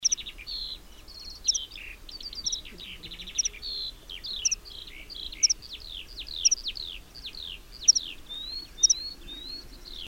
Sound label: Antpra, Anthus pratensis; 3.6.2004 Tammela, Suomi. Varoitusääni / Finland. Alarm call.